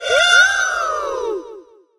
hurt vo; tara

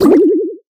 bubble hit; Bibi

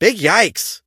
hurt

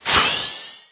magic archer fire

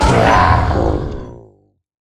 ravager death

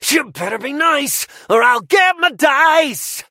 hurt vo; draco

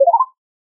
atk reload; gene

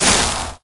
footstep; ice wiz

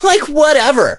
hurt; Poco

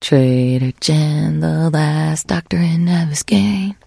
singing; 商人杰恩（七日杀）

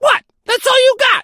crow hurt; phoenix crow

